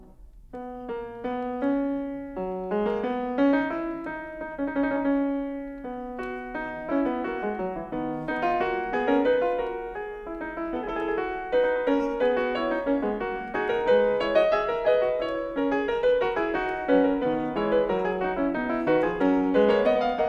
Fugue No. 23 in B major, Bach, Well-Tempered Clavier; BWV868